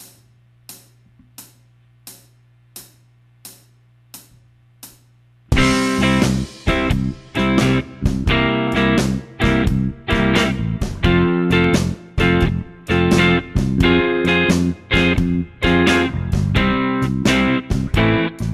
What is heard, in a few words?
play along full speed